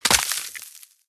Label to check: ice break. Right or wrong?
Right